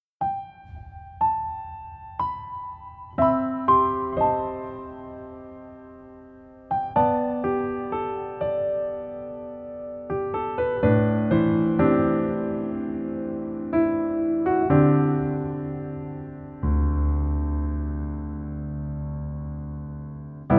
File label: Piano ver